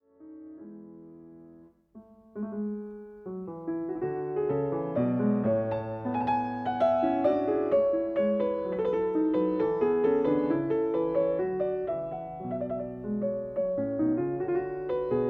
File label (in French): Suite En La Majeur Allemande